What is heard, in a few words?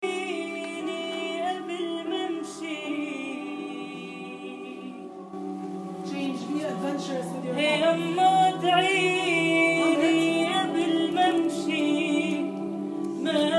rehearsing an arabic mawal; in preparation for the prayer for gaza concert; amman